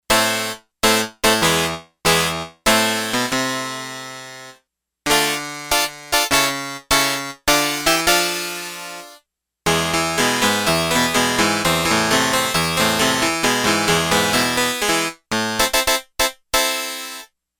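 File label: Yamaha DX11 Harpsichord; Factory Preset Voices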